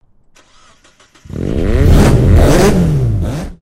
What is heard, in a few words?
Cars Rev